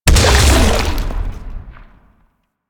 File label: Jump Land; Enemies; Infested; Warframe; Femur